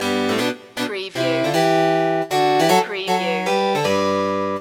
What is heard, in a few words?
mini minuet loop